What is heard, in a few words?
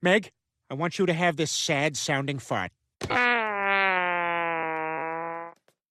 sad fart